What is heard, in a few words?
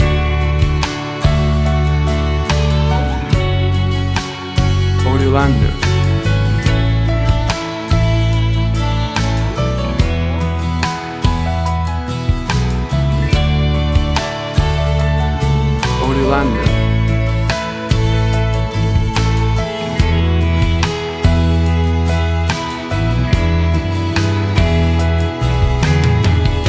Slow Acoustic Country Romance Ballad; 60 SEC